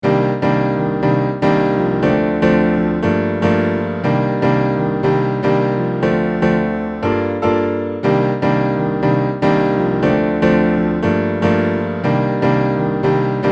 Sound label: yamaha piano